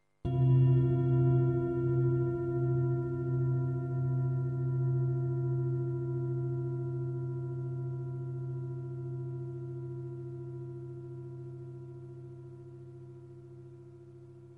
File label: singing bowl